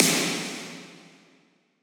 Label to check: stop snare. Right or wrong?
Right